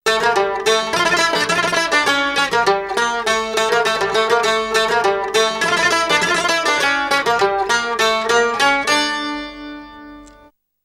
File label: Loop Mandolin Rawab